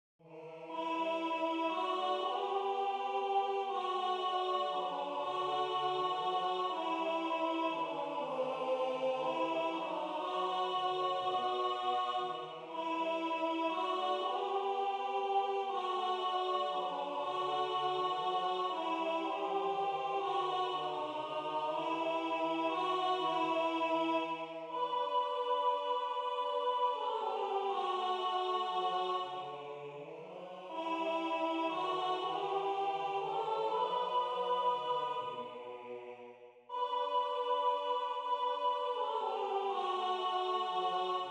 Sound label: Greensleves Alt; Greensleeves Teil 2 (Takt 42 bis Takt 74)